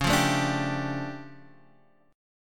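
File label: D Minor Major 11th, D flat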